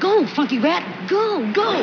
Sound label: Go Funky; Benita: "Go Funky Rat, go, go!!"; Bugaloos